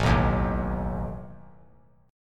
A Suspended 2nd Flat 5th A down; Asus2b5 strummed

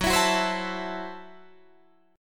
F sharp Minor 7th Flat 5th F sharp Guitar Drop D

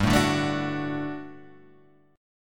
G Major 7th Suspended 2nd G

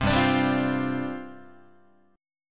G 7th Suspended 4th G down Guitar; G7sus4 strummed

